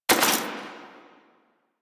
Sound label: Mech Alt, Rifle; Weapons; Warframe; Thanotech; Trumna Prime